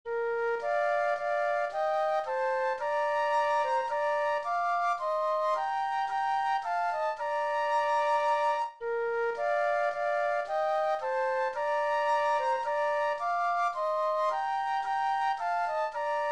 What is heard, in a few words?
Flute Duet